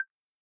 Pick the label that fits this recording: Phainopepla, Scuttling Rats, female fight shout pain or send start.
send start